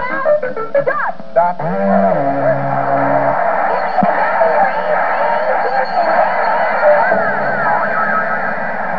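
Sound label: cheerleader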